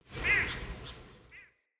Night Creature Medium; Night One Offs; Ambience

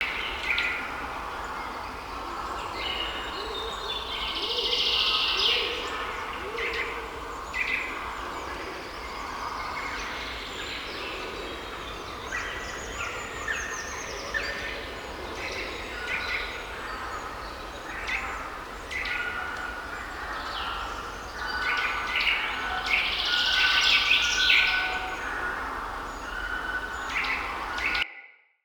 Wetland Forest Day Amb Loop; Midrath Island; Ambience

